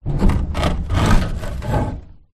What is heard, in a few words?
foley wood planks x2 scrape against each other on metal garage wall